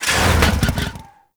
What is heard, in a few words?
Power Slide Stop, Motorcycle; Warframe; Motorcycle Ninety Nine